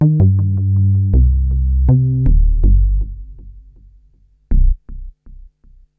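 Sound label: synth bass, ambient, 160, C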